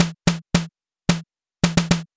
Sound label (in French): caisse; MOBICLIC 14